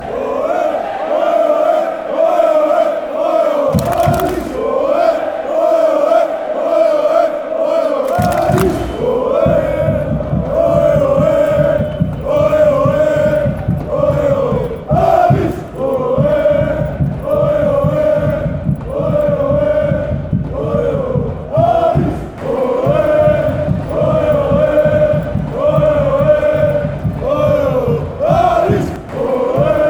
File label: aris chant